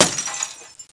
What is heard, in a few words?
Smashing Automotive Headlight; How Many Bugs in a Box